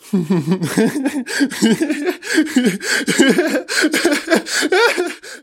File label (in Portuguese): risada cômica; zoeira